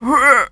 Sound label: pain, Zombies; zps; lea